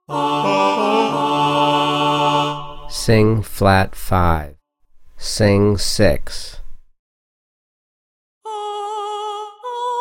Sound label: D major Sing b5 and 6 Ascending Soprano; contextual ear training voice edition 2